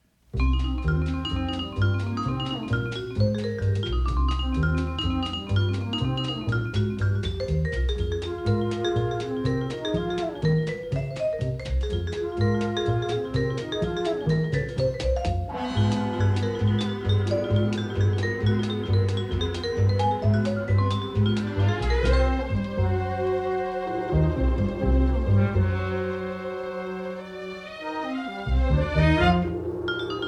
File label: Blues; Shaky Clutch